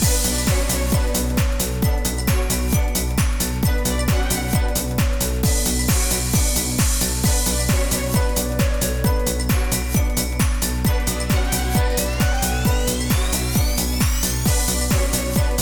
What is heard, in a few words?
Unused Dance Song; Attack of the Toybots